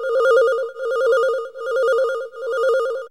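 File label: METAL DRONE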